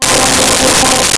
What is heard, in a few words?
Choir Ahhs C4; Nintendo; July 2020 Gigaleak